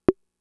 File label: Conga, Fricke MFB-522